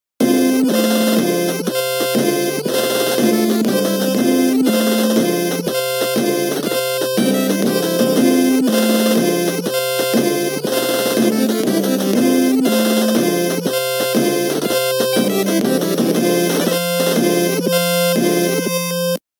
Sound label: Game Theme; Rampart; NES